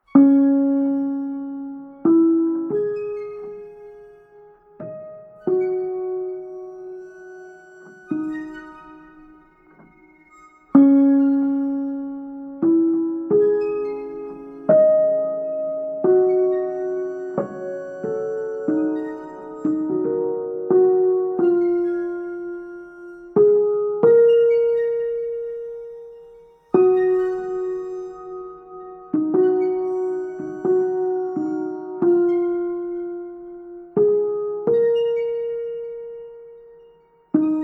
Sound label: Instrumental